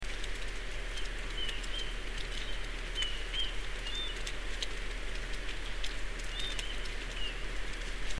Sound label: mystery calls